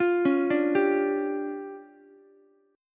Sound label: D Minor Major 7th Double Flat 5th, D, Ukulele; DmM7bb5; arpeggiated; JGuitar